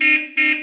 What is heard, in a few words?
car horn